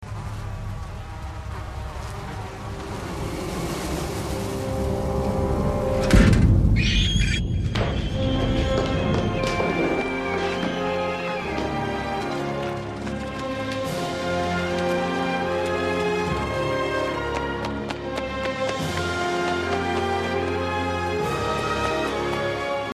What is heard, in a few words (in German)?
hoer kaninchen; bern